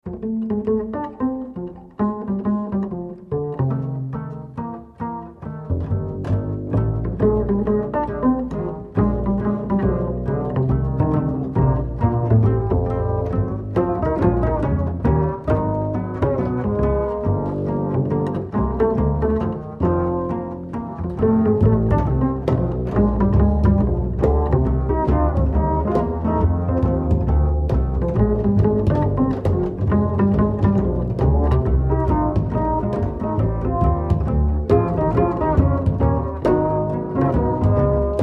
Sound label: bass boom